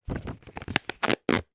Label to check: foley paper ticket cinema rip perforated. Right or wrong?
Right